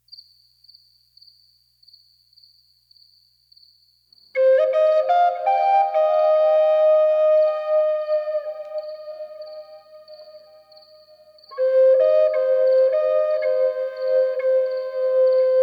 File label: Native American Flute Crickets Lullaby Native American Flute with Crickets as Night Fa